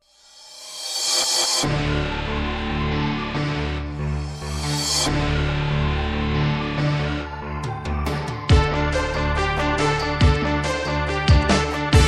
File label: news rock brass